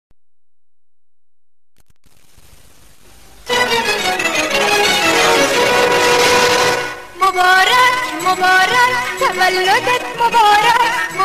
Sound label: Birthday music; تولدت مبارک